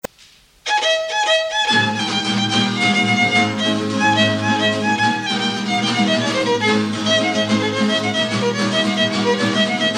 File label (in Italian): tarantella